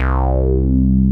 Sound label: DIGALO C3F, DIGALOGBS